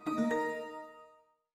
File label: Windows Print Complete; Glass